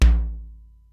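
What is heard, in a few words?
Simmons SDS-9 low tom, Drum Machines; Factory D4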